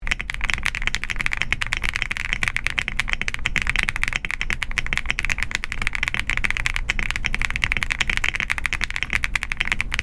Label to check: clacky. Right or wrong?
Right